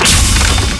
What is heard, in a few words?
blower gun; monsters; quake2; coop; bossz